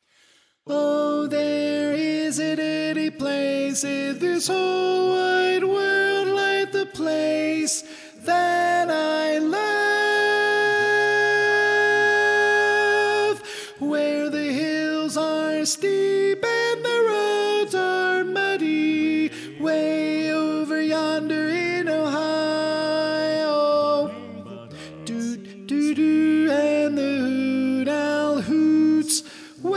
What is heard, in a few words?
Tenor